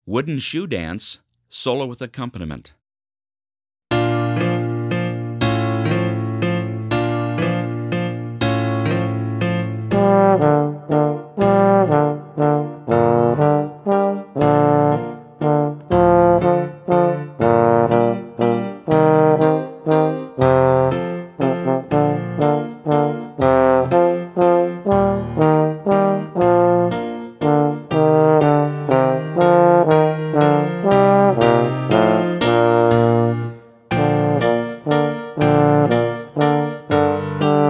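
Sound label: solo wooden shoe dance euphonium; festival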